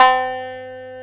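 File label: shamisen C2, ETHNIC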